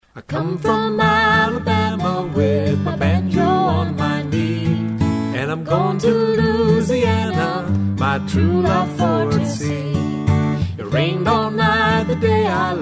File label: Funny Long Song, songs for kids